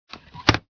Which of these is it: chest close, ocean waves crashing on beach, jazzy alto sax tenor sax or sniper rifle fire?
chest close